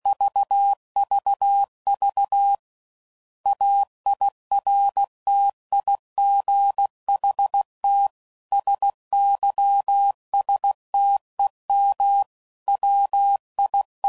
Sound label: CW Code Groups 15WPM; Sayings; Chuckles